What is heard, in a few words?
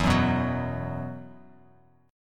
E Suspended 2nd E, down, Bass Standard; Esus2 strummed